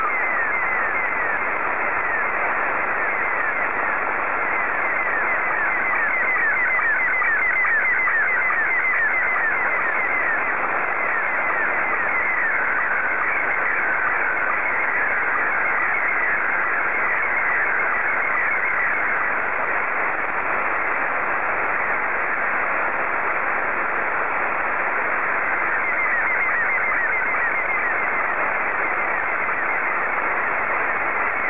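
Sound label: SSTV, Scottie 2; JA3OEN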